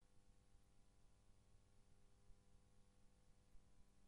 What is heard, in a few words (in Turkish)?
oyun 2 4 ritim; Gem WK8 SE